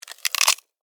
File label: Termite Bite, Animal, Termite, Bite, Hard, Crunch, Wood, Insect, Bug; Ant Sound Effects; BlastWave